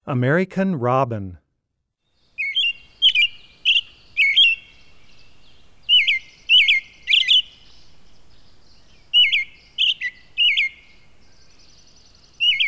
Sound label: American robin, Wildlife Sounds